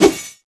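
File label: weapon miss; tm ventrilo; slender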